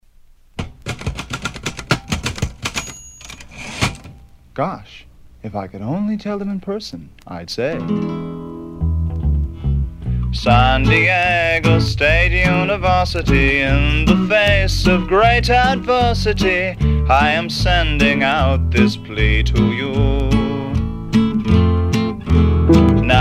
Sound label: Blues; SDSU